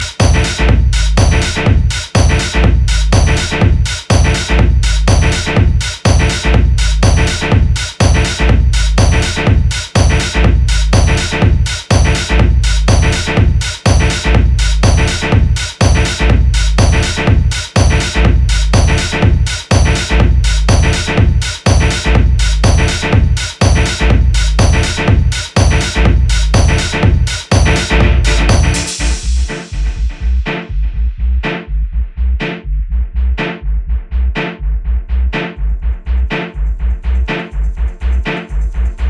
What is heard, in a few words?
Dub